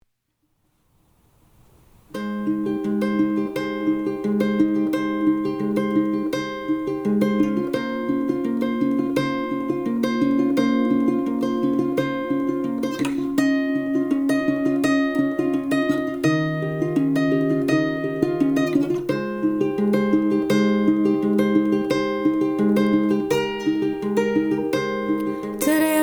field recorder version

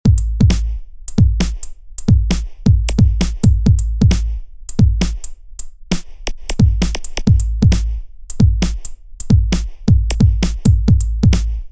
drums fulls

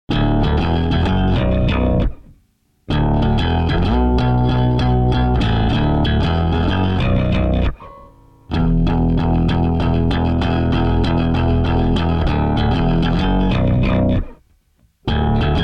happiness bass

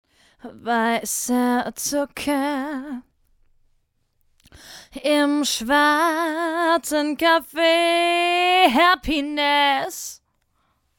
happiness voxbrdge

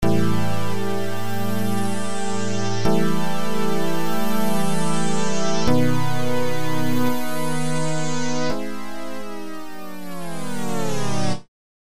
float synth

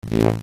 voc

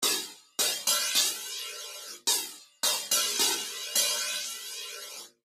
hihats

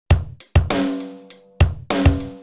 drumloop

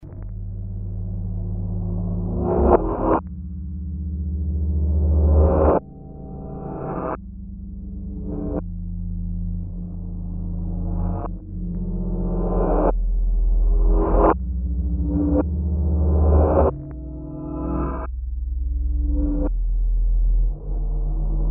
bell ensemble modulated

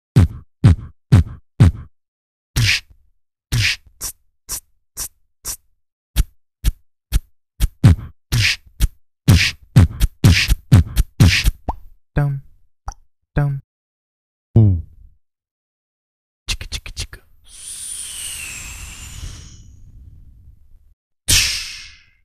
drum kit 125 bpm

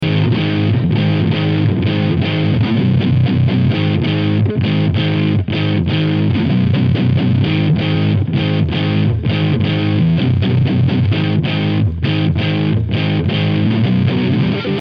neon git

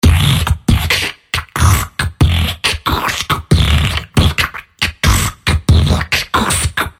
beat

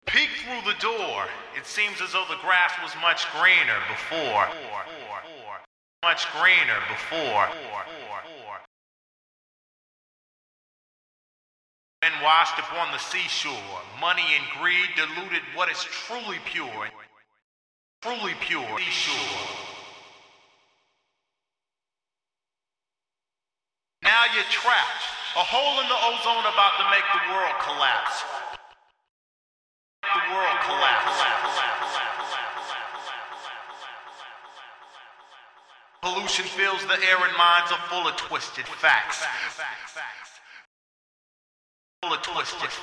vocals

